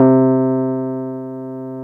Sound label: C2, Keyboards Old School; USB Soundscan vol.09; AKAI